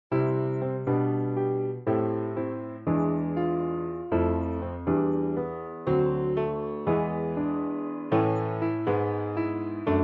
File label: modulation